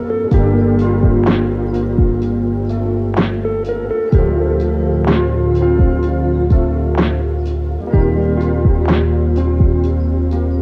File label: Relaxing Music